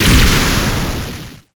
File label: wand scorch